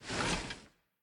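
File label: movement armor metal; Smite; Cabrakan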